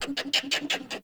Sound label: Forest Animal Close; Ambience